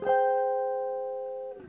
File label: GUITAR LICK; Ueberschall; Jam Box